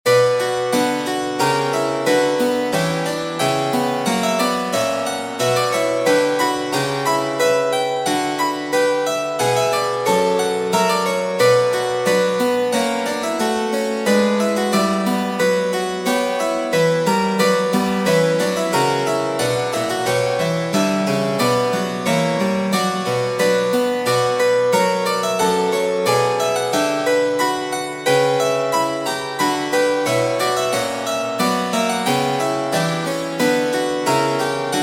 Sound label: Canon Cancrizans in C minor